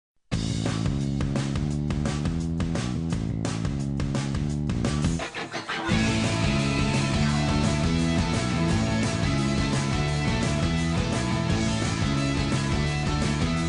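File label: background music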